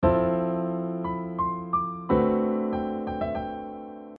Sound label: chord sub - D7 add 13